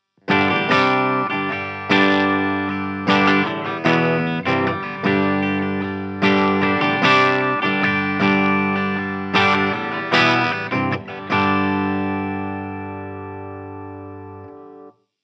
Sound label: JHS Pedals Hard Drive Off